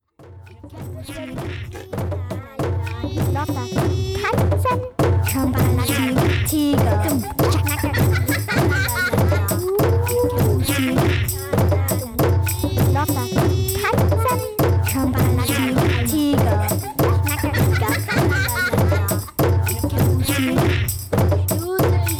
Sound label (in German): Trommelstück